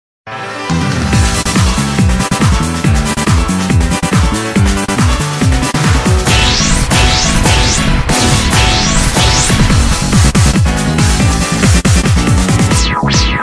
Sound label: prog piano remix; sdvx 3 gravity wars